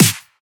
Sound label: raw snare drum sample f sharp key, Royality free steel snare drum sound tuned to the F# note. Loud